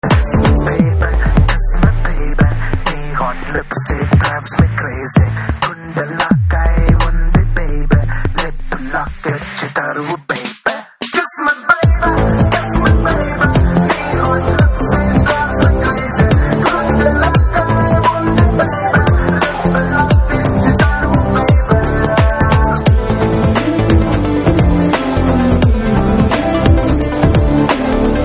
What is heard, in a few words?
Ringtone